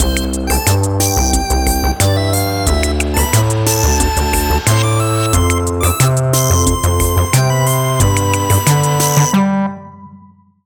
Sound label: Bonus Cheesy; MU AMB Bonus 01 Cheesy